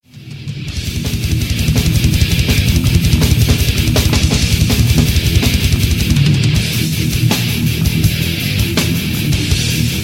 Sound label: Death Metal